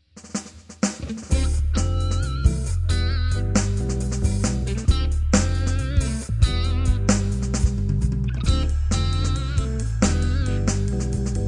jazz instrumental

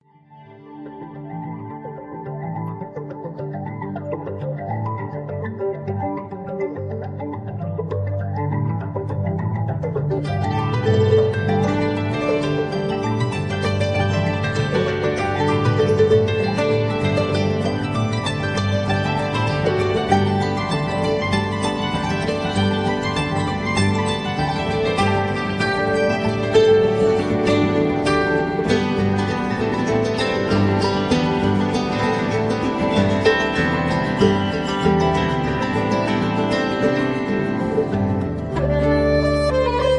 folk music

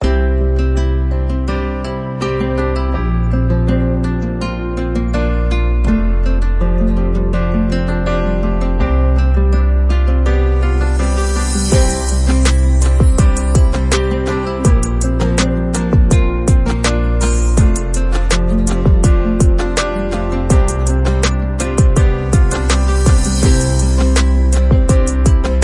Light background short music guitar hip hop

